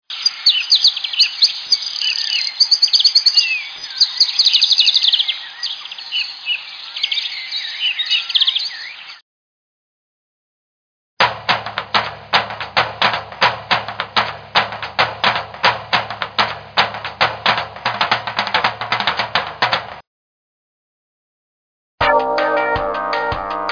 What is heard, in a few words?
WakeUpSounds longLoop; aXbo